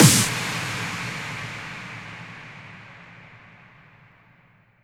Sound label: Impacts, Effects